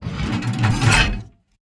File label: gun reload; World of Tanks